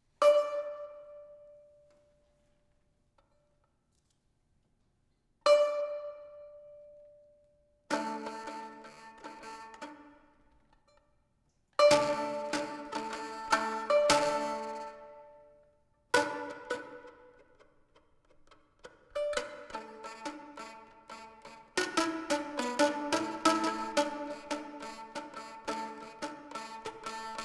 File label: Improv Interlude; Reza Abadee; sound of montreal